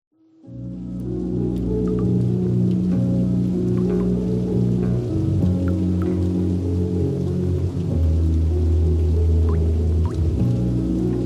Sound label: instrumental with rain